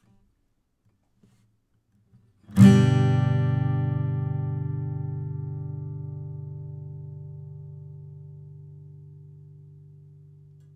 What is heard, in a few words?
outro